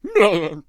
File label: Bard Attack Vocals